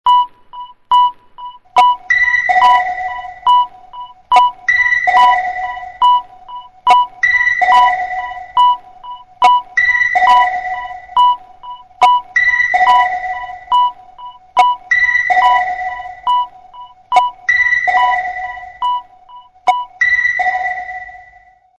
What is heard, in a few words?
sonar tone mixed